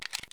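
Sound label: pistol magazine in fail; Escape from Tarkov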